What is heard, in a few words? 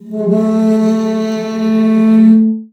CELLO FINGER; 90 s Sample CDs; Xsample VOL-5